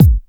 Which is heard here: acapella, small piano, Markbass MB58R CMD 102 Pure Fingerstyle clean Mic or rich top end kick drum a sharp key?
rich top end kick drum a sharp key